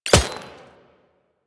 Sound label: Kinetic Plating Shield Hit; Warframe; Runner